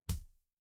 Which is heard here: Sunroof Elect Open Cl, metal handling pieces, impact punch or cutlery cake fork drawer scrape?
impact punch